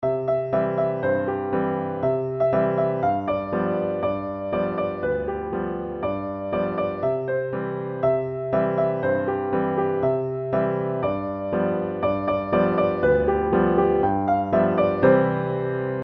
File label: easy piano duet; traditional; little tommy tucker